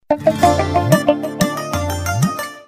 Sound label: Sms Melody